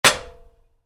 Wood hit aluminium, Wood, Light Impact: Aluminum; Materials; Wood Sound Effects; Wood (Sound Effects:Materials); Stuart Duffield

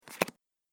Cards Card Flick; casino

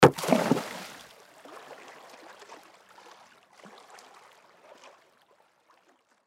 Water Splash Object Drop Rock; Water Splash Object Drop Rock In Pond Waves; Splashes (Sound Effects:Objects); Blastwave

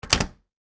Foley Deadbolt Lock Door; Locks

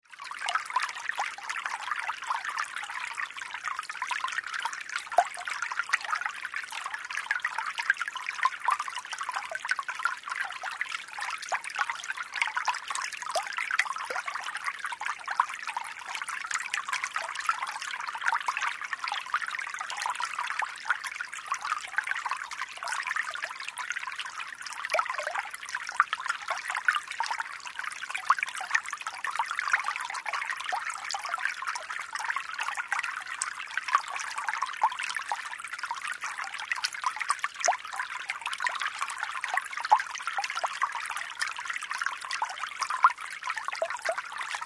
Water Feature - Small water flow; Nature; Tom Hutchings

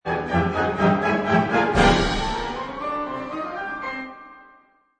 Orch Hit n Run; Orchestra crescendos to a hit following by quick piano runs

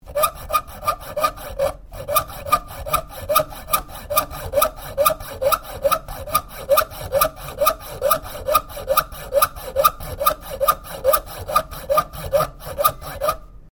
SAW COPING SAW CUTTING STEEL; small domestic coping or hack saw; Saws; Machines

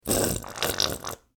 Food Ketchup Bottle Squirt; Household

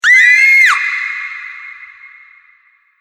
Distant Banshee Scream, Monster Sound Effects

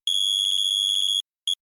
Beeps Data Transmission Technology

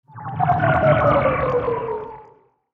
Intergalactic Federation Starship; Sci Fi Music; science fiction sound effect; star wars / trek style games

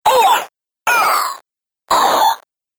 Robot Droid Death Selection, Robot Sound Effects

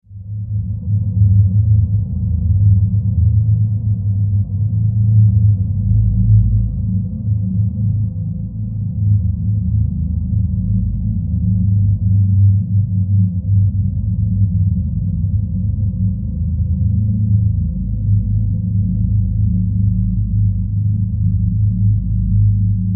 Horror Drone Deep Rumble; Evil Sound Effects; Evil (Sound Effects:Horror)

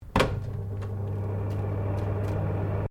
Fan oven exhaust low startup; Fans; Electronics

